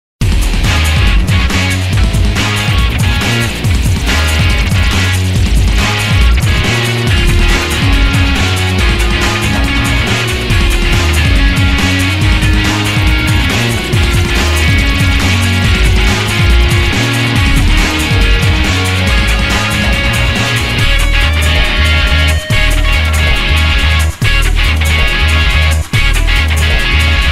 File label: Stadium (action); hot wheels bash arena